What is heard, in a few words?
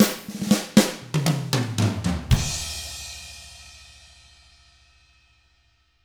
Drums, R N B 6-8; ILIO; Double Platinum Drums 1